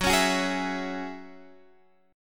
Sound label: Gb 7th Suspended 2nd Gb